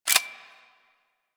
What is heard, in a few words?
Glass Shotgun Fire Stop; Warframe; Tenno; Weapons